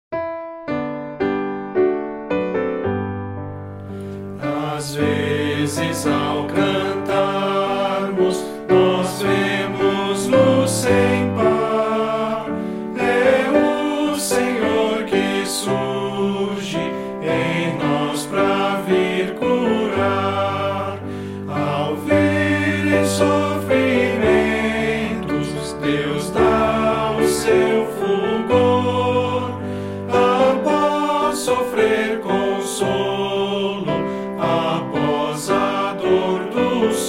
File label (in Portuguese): voz piano